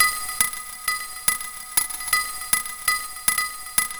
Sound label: Jangling Glass; Metal